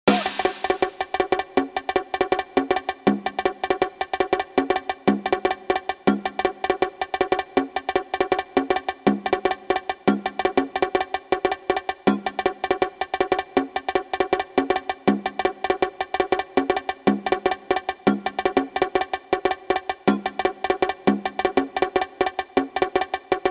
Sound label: non repeating bongos